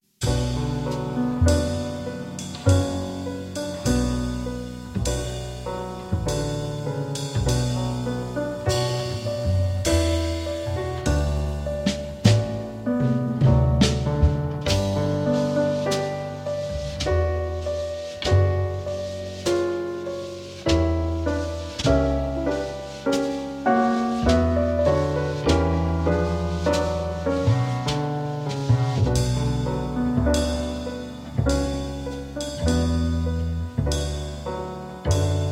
In B Minor, Jazz Ensemble

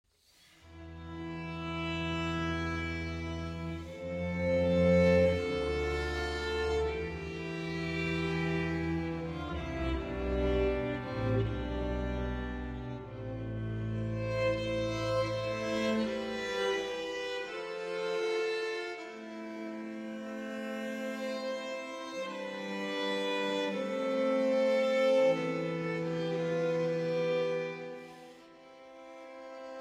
Mille regretz, Strings; Des Prez; Arr. Montanari

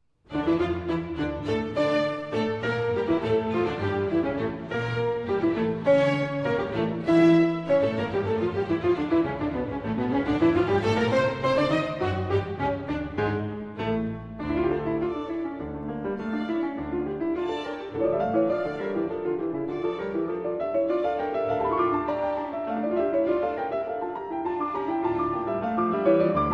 Keyboard Concerto in D Minor, Allegro; BWV 1052 I.

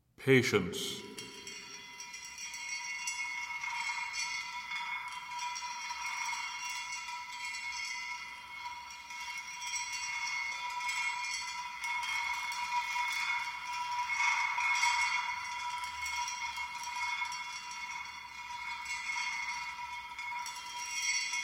Version For 4 Voices & Percussion; No. 7, Patience, Patience!

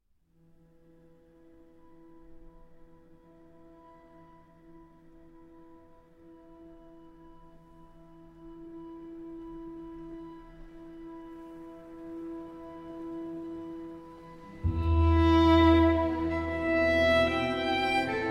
Solo Violin and Strings

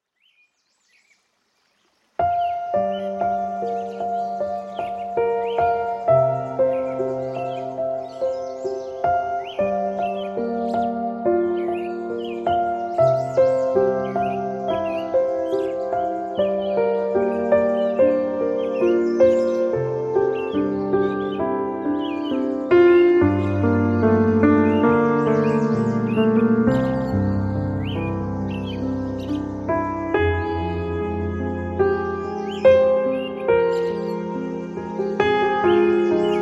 Magic Piano

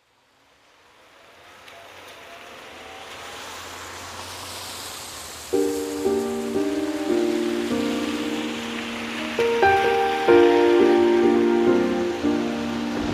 Piano and Rain

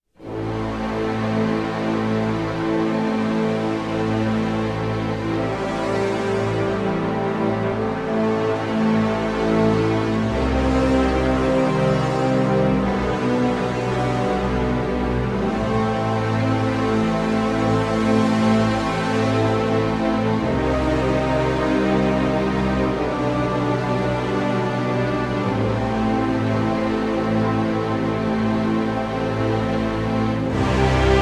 Orchestral

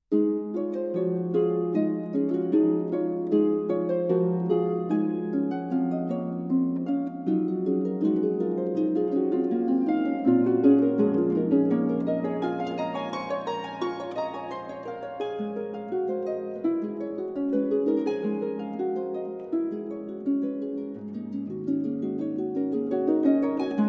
Harp Sonata Allegretto

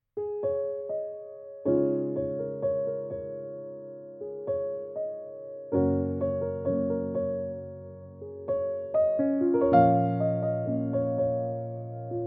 Piano Version

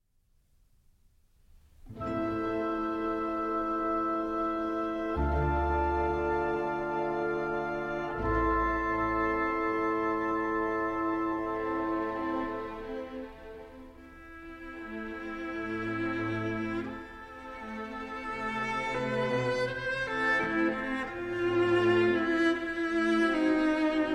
Cello Concerto in A Minor, Nicht zu Schnell; Schumann; Op. 129

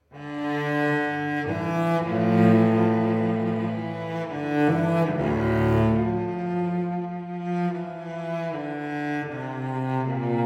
Suite in D Minor, Sarabande; Suite No. 2; BWV 1008

